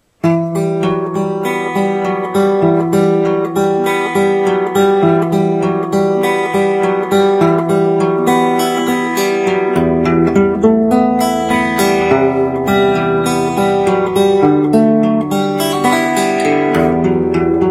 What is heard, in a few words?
guitar; STALKER